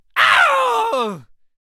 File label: death vocals